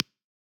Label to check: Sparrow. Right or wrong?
Wrong